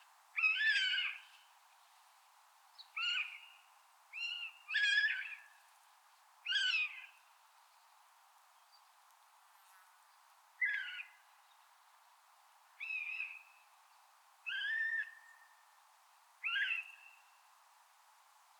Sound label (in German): Rohrweihe Circus aeruginosus